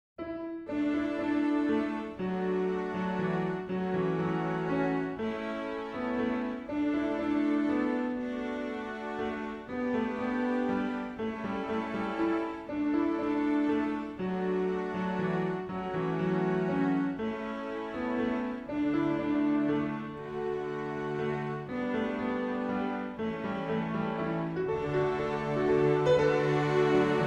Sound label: Classical Study Music Tranquil Music Sound of Nature